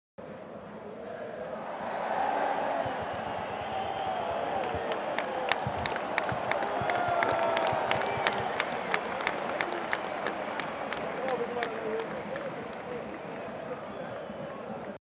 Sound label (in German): Stadion Fan und klatschen